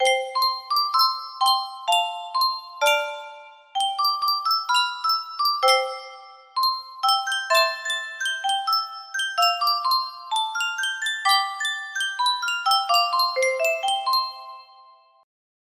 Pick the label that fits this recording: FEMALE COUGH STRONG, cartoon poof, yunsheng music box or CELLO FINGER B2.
yunsheng music box